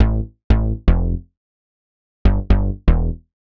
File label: Digi Bass (120BPM), retro house